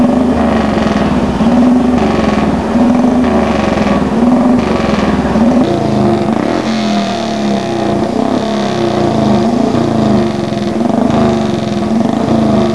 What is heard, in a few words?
Jap Sound